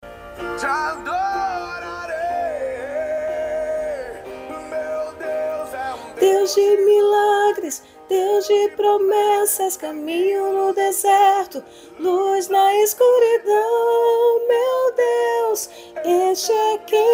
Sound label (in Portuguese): soprano